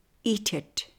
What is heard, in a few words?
sleep